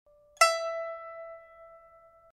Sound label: pipa; chuYinKouXian